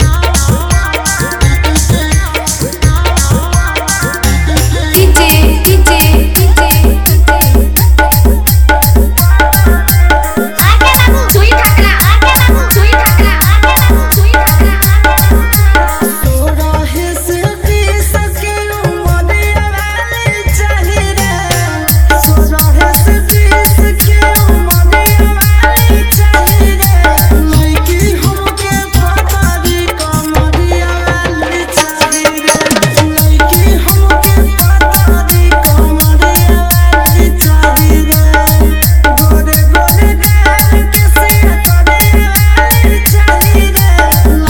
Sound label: Nagpuri Dj Remix Songs